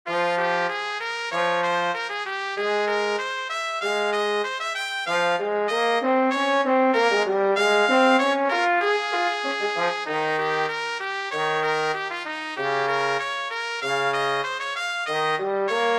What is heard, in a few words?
Brass for 2 3 trumpet and trombone